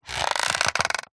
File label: plastic creak stress; zapsplat; foley